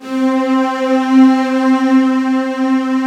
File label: ANLG STR, ANALOG STR